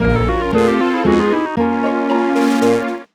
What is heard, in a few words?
Failure In Contest Jingle; My Horse & Me 2 Riding for Gold; nds